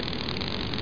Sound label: snake